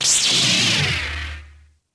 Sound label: lightsaber on; weapons